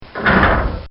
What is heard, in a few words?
Stall Door Closing